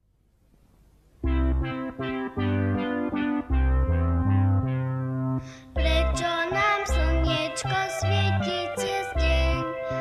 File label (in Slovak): Piesne Pre Deti; Slovak